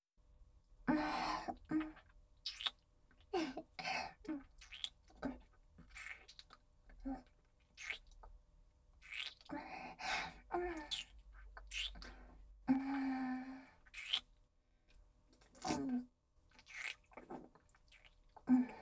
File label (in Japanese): 長舌ペロペロフェラ; おくち当てクイズ; メイド編; ユキのフェラチオ集中トラック